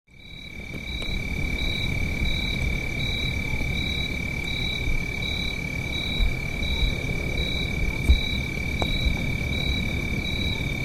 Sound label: night sounds